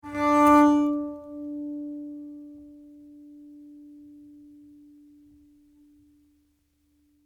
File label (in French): Harmo arco